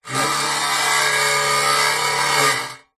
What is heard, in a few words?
industrial power tool small vibrate grind hollow metal short burst